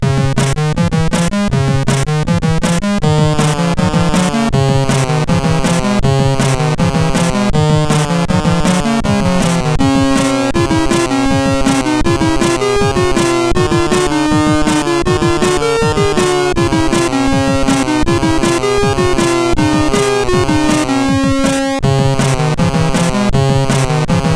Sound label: sid, CSG8580R5